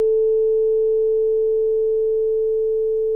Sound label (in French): Diapason La3